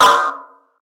2000s Round Snare Sound C# Key, Royality free steel snare drum tuned to the C# note